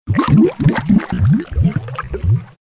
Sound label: Bubbly Bubbles